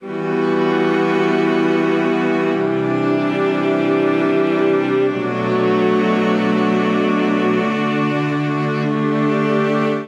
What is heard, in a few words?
Progression E Minor 95 bpm, string quartet; imin9-VImaj9-vmin7-VII; MIDI Clips; Countryside; Ableton; Factory Packs; Spitfire Audio